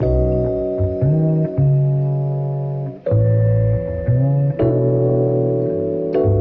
vibes, phonky loops

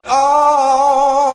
"oh" sung

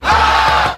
"ha" crowd; ooh ahh

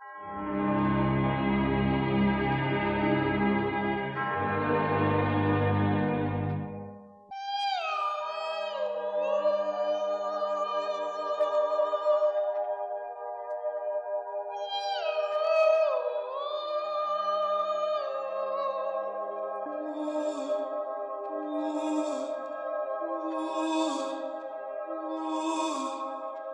strings, Sad

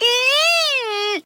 ehayeah; ooh ahh

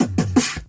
bmm bmm mash, Beatbox, Drum loops

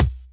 Kicks

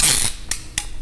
zip click; Drum loops

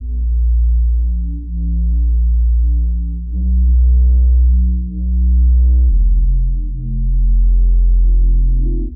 desolation bass, Electronic Loops

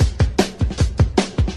Drum loops, Funky

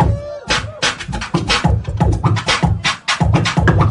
scratch beat; Drum loops

